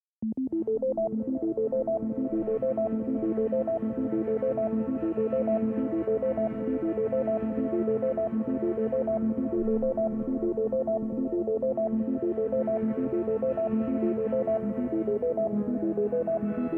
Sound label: no melody; No Piano Melody